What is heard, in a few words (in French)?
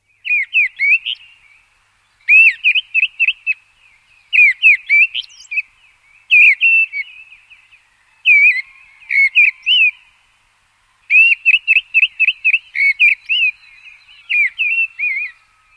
merle grive